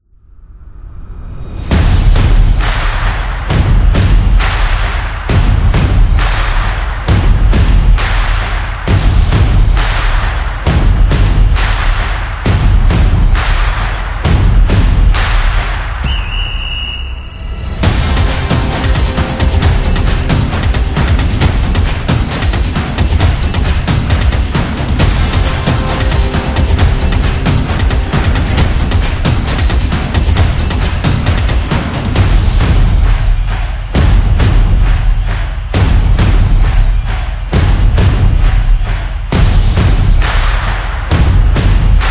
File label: Football Music